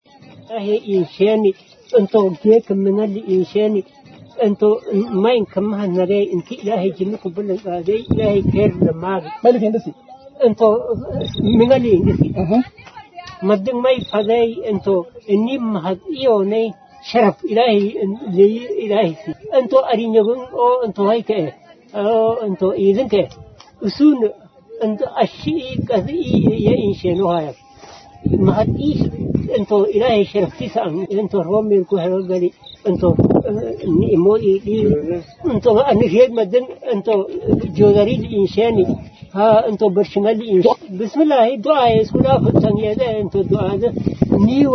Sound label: dheere sax